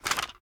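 assault rifle reload empty extended magazine drop mag; weapons; gmod; viper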